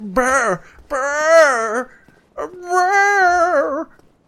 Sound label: bwooooh